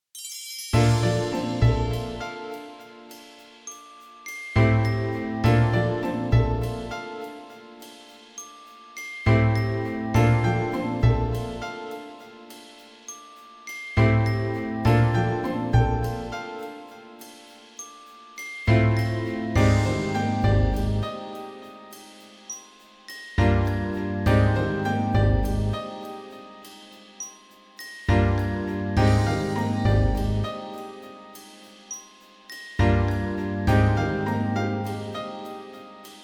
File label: bgm game result; Silent Sign; android